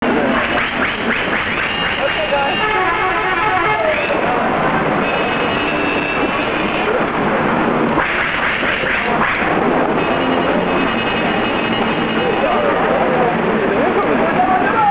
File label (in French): klaxonne fou